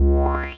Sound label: wave; Oryx; pyrogue